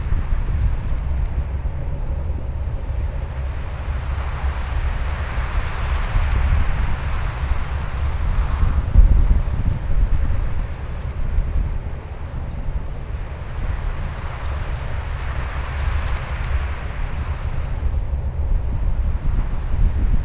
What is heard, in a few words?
Wind; magic era